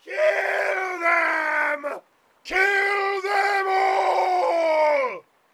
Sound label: warcry; taunts; hunters